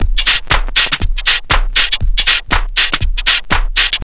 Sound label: house loop